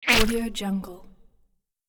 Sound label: body and guts squeeze; human sounds; فشار بدن و روده